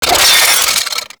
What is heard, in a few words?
Drone Blade Jump; Warframe